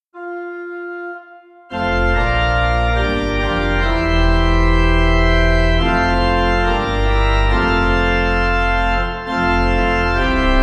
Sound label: Lords Prayer Pipe; Communion Service